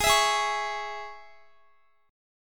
Gsharp Suspended 4th Sharp 5th, Guitar; strummed; x,x,20,21,20,21; 4F,4A,5C,5G,5C,6E

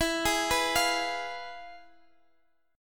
F Minor Major 7th Flat 5th, E

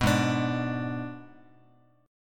G sharp Diminished G sharp, Guitar; G#dim strummed; JGuitar

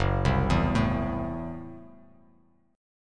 F sharp Minor 7th Flat 5th F sharp; arpeggiated; JGuitar

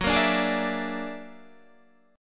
Gb Augmented 9th Gb down Guitar Standard; Gb+9 strummed

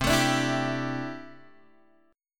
C 6th Add 9th, C, down, Guitar, Open G

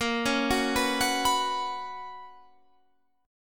B Major 7th Suspended 2nd A sharp, chord shape

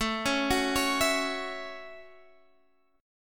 A 6th, guitar

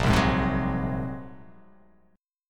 A sharp 7th Suspended 2nd Sharp 5th

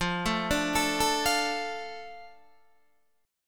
F 6th F down Guitar Open D

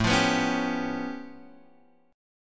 A Augmented 9th A Guitar Open G

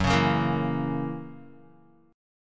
Gb 7th Gb down Guitar; strummed

